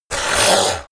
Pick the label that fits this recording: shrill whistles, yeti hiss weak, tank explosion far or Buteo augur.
yeti hiss weak